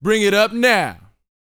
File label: VOX, R&B Dance, HIP-HOP